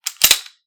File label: gun slide lock